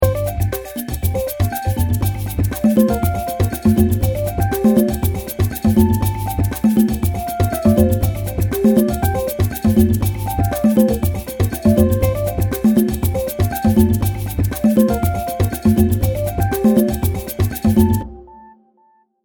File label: Funk tone